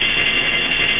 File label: monster beam; battalion